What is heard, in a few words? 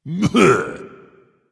tongue dragon; fafnir